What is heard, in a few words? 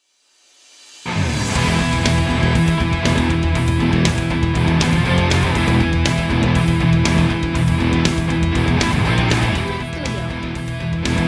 Daft metal